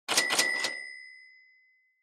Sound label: Cash Register Drawer (Low)